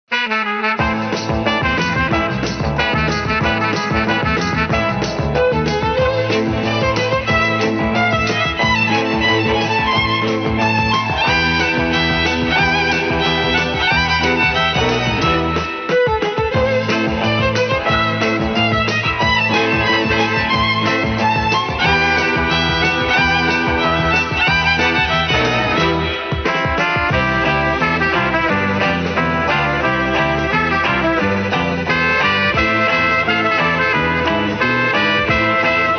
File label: The Turkish March